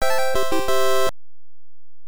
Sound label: Score Fanfare; nes; Dig Dug II